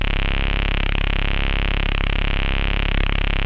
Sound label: BASS C2; JGL BASS; Jungle; USB Soundscan vol.05; Explosive Jungle; AKAI; 90s sample CD